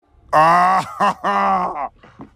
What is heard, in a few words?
laugh; will smith